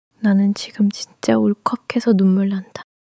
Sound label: crying